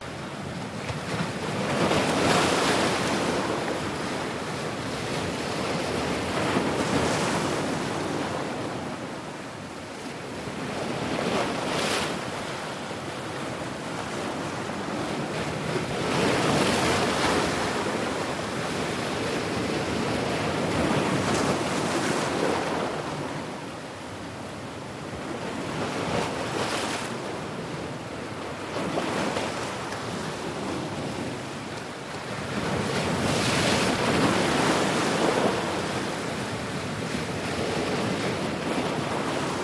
Sea open Splashing Med Waves Splats; ORTF; PMSFX

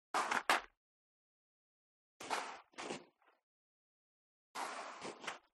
FOLEY STEP High Heels Gravel Light Scuff; smartsound

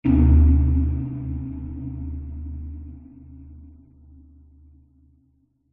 sound design hit metallic dark horror reverb; zapsplat

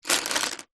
office pencils x30 set down on wooden table

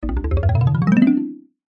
cartoon ascend climb med mallet; zapsplat

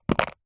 food candy bubblegum tape plastic tub set down

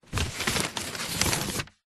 sheet of paper movements on cardboard box; foley

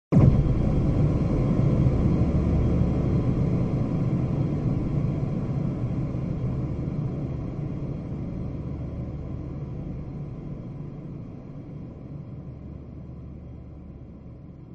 science fiction impact soft long reverb; zapsplat

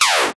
science fiction laser fire

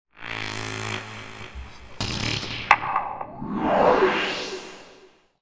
sound design transition strange fart sweep reverb

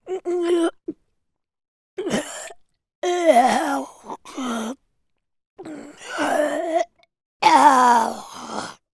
human choke strangle moan; zapsplat

